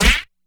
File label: PUNCH; ROCKEM; DIRECTX